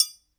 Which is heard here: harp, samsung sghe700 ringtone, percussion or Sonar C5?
percussion